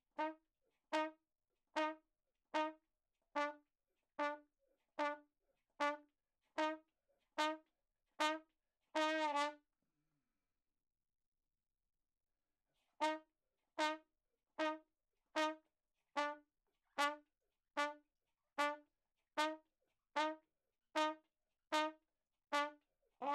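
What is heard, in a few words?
RAGTIME trumpet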